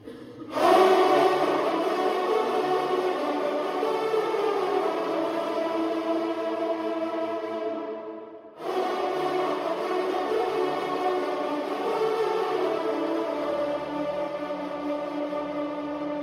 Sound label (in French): EGLISE MESSE 3EME sonnerie interieur; CHEF BOUTONNE 79; EGLISE NOTRE DAME; MESSE SAINT HUBERT